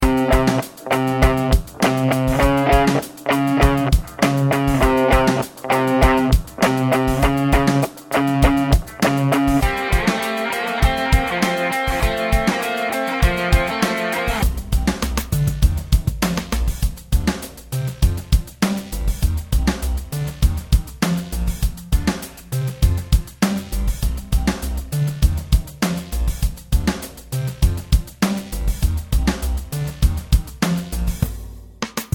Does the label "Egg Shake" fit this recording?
No